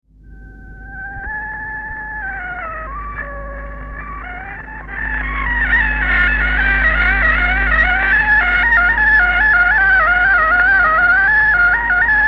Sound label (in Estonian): Labajalavalts torupill folk; Kuusalu